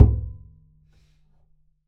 perc, pizz; meatbass

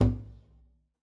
pizz noise slap resp, double bass

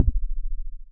kick retuned a bowed; kicks

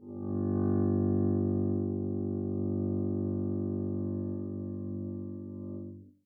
arco g1 mf down, double bass

